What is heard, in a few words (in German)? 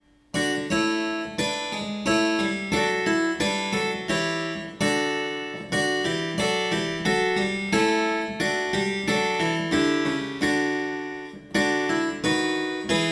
Unterstimme identifizieren